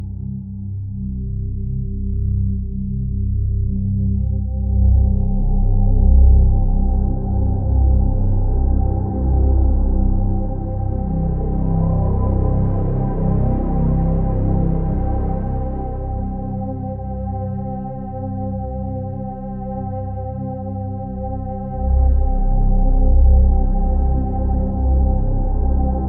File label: Drone; Discovering Space 2 VR